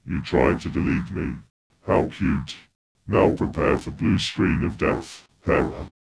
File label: virus scary